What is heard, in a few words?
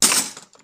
tools